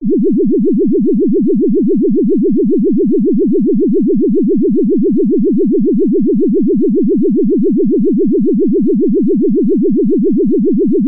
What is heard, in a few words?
emergency siren alarm med pitched wobble